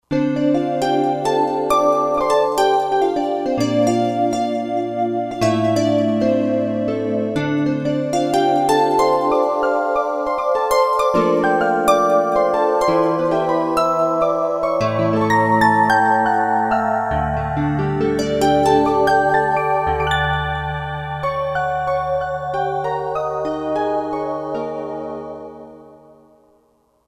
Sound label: Betazoid Harp, Roland JD-990; Factory Patches